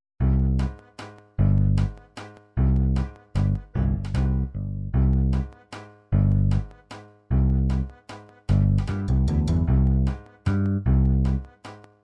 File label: psr9000 mazurka, midi